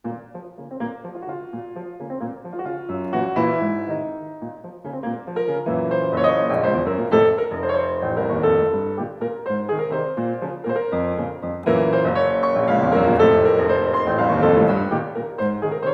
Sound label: waltz